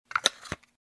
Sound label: Construction